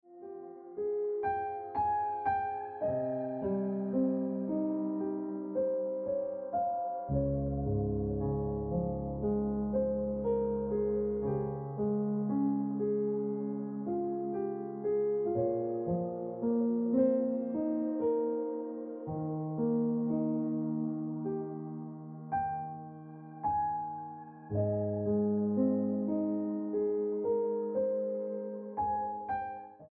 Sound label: Ringtone Piano Music